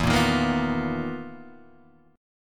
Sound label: B Major 7th Flat 5th E, chord shape; BM7b5/E; strummed; JGuitar